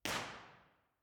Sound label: central hall; central hall university york; b-format